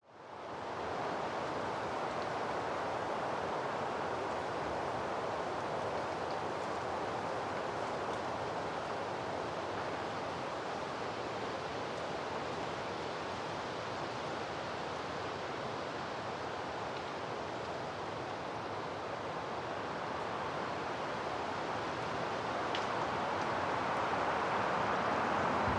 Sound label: wind noise in the forest forest wind sways the trees